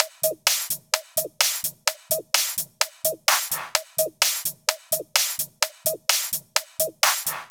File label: 128BPM Dubwise Kit